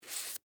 Cigarette Remove, Foley, Cigarette, Remove, From, Pack; Smoking Sound Effects; Human